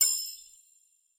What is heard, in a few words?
balloon end roulette